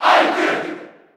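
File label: Cheer Japanese; Ike; SSB4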